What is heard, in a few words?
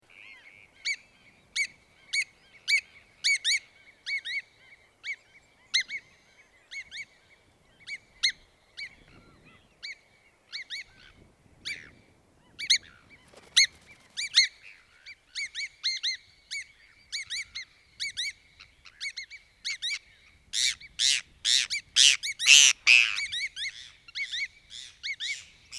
Hudsonian Godwit, Limosa haemastica; shorebirds rails